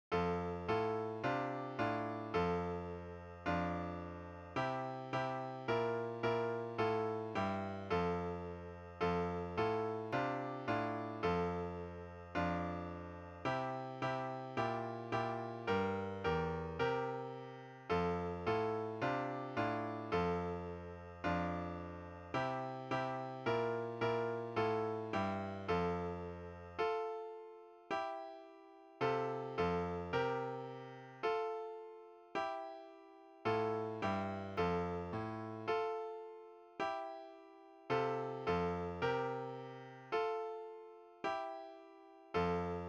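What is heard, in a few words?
Solo 6 F brass; Solo Six; stage 4; Development Band Tuition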